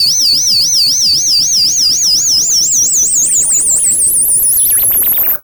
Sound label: HOVERING, Anime Sound FX, SCIFI; AKAI Anime Sound FX